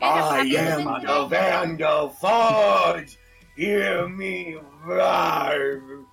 rawr

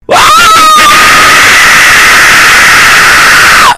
uaaaaaaaa

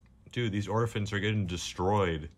techno jump

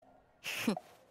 noises; gabriel dropout; satania

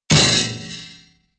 bass drum and cymbal crash; disney

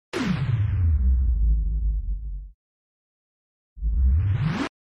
slow motion sound effect

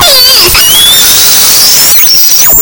shortest song

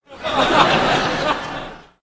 quick laugh

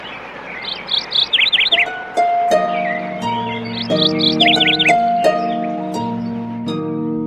chicken; french

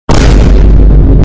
vine boom extremely loud

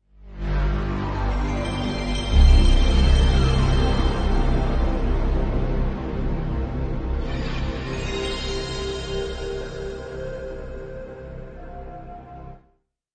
playstation startup sound

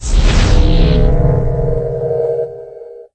magic powerup

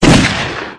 gun sfx; house of the dead 2

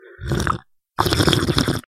slurp; soundbible

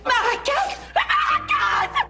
maracas

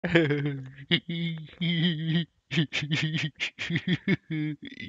bad fallen risada

